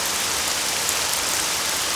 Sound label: rain loop